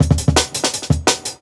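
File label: Jungle Breaks, 170 bpm; Burst; Original Jungle Breaks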